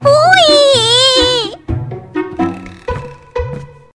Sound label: poiii